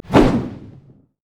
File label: barrel roll; LoL; gragas